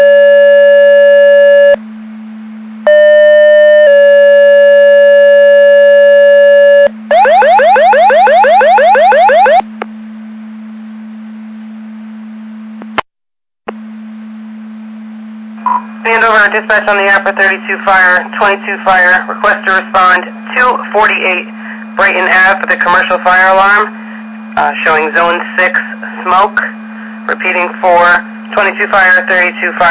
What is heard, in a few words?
Siren; Andover Twp Boro